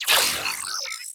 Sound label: Ship Advert Hologram On; Corpus Ship